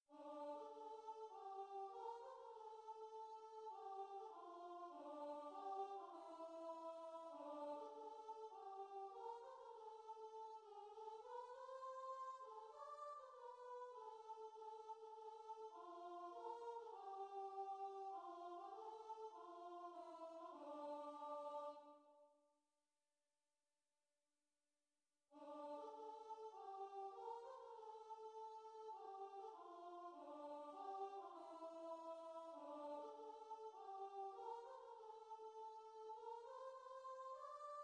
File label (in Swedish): herre mastare sopran; Herre Mästare kär sopran; Matteus; matteusmassan 2011